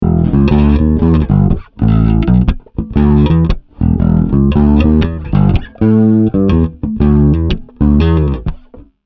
Boss GT-10B Ampeg SVT

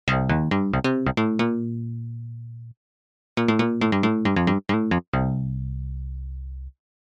Yamaha Genos2 FM Bass1 DX7

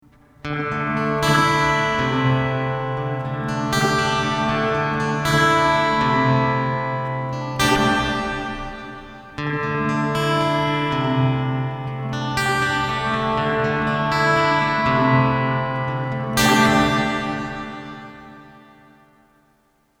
mooer shimverb pro digital reverb

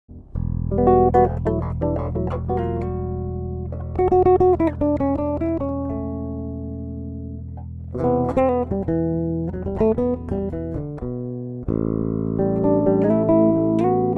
Upper Register; Marleaux

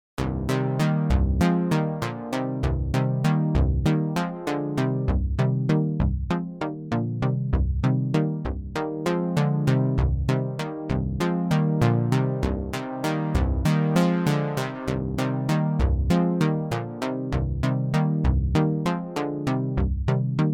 Eventide Quadravox

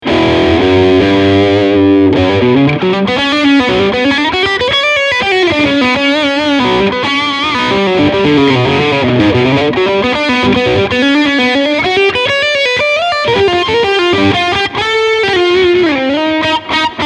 High Gain

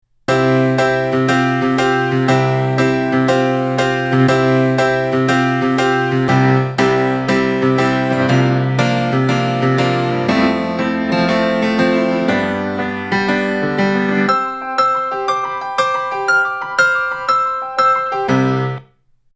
Piano

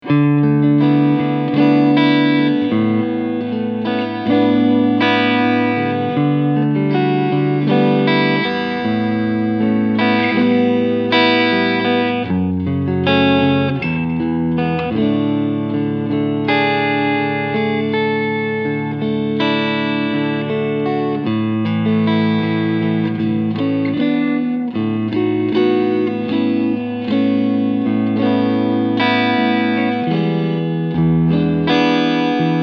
Clean Front SC Modus

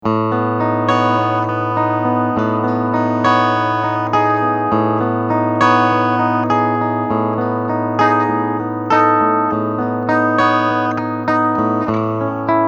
Clean Picking

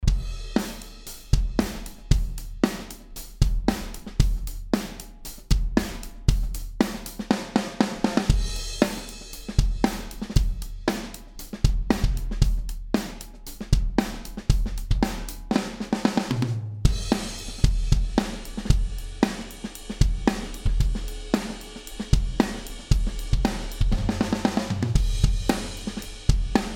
Kick n Sn Trig Pearl Ref Yamaha; Gadd

